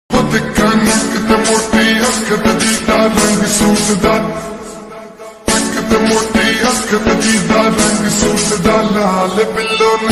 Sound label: slow reverbed ringtone